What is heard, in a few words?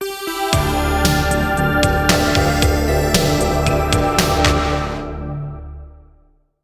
Zombie Wave End Boss